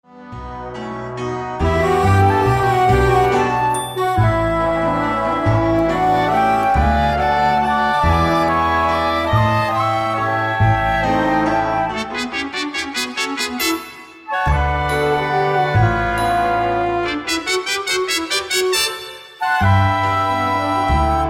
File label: Production Waltz